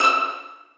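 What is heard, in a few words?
2nd violins pizzicato e6; Sonatina Symphonic Orchestra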